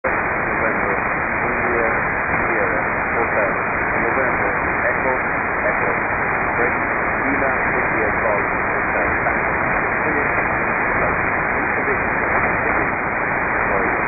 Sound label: Valentia Coastguard Radio Ireland; EJK